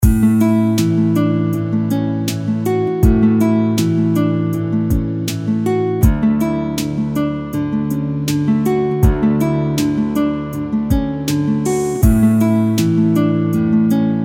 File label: Positively Neutral Short Loop